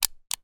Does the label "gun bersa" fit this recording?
Yes